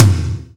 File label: acoustic tom sample f key; tom drum samples